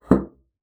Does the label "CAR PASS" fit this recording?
No